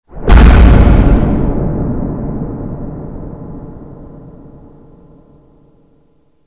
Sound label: Stinger; STINGERS